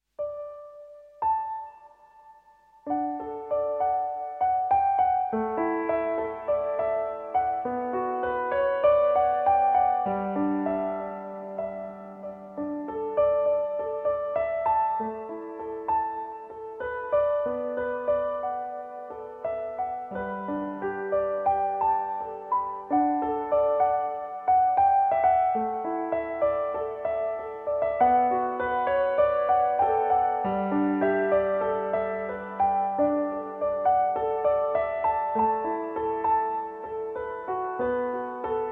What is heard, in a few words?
(Arr. For Piano)